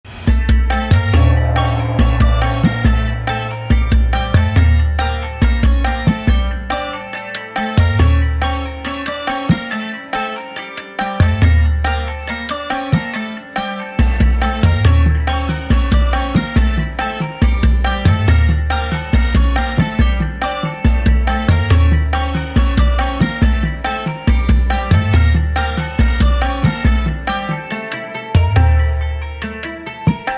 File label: Islamic Background Music; Noise Library